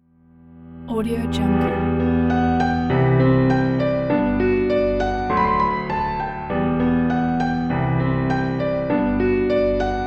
inspiring piano

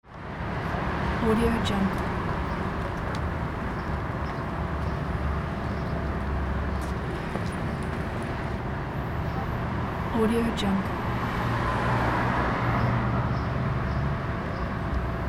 the city street; n-audio

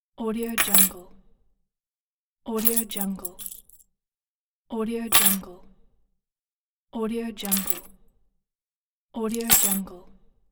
keys drop on table

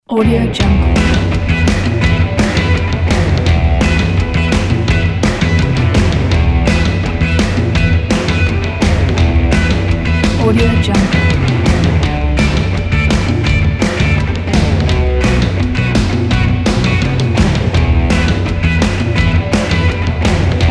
rocking promo

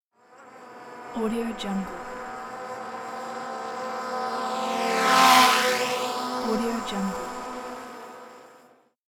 drone passing; dragospitaru

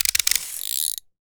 engineer socket crank sequence; war ui class specific; esmteam

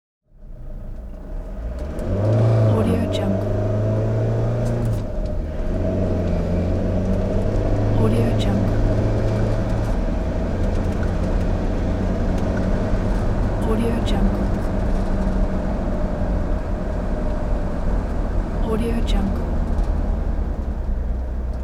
van driving interior

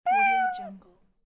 cat meow

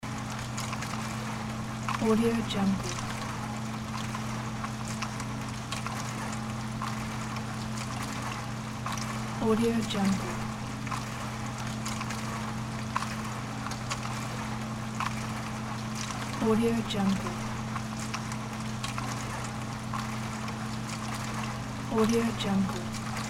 dishwasher

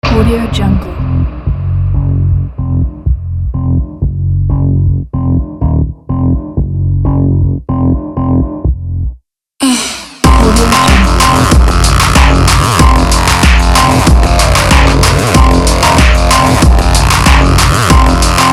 extremely powerful

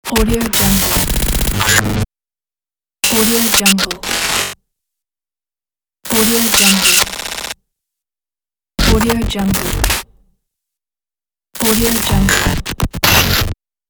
glitches

